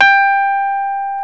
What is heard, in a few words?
PNO, Rhodes Mk.V; Keyboards of The 60's and 70's